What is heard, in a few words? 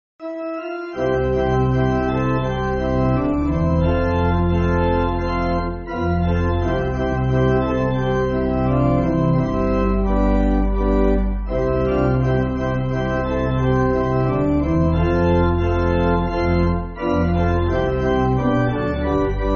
Pipe, church music